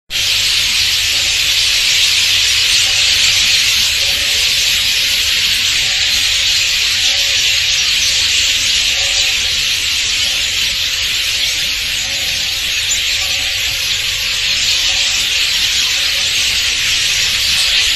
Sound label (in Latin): Dolichonyx oryzivorus; San Javier; Jorge La Grotteria